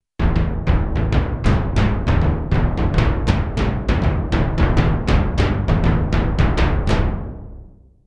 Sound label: drum timpani, synth Roland alpha juno